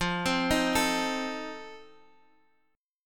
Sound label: C Minor 6th, F, chord; Cm6/F Chord {X X 3 5 4 5} arpeggiated